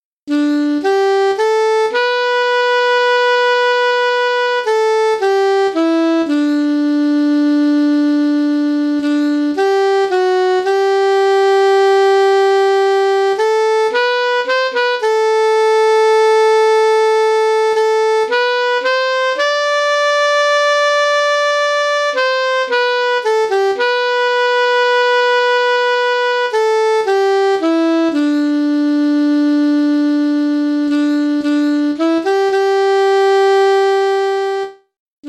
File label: alto sax